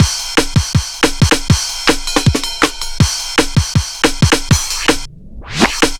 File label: 160, Drum Loops; Total Drum&Bass